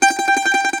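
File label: MANDOLIN TREMOLO, Plucked Strings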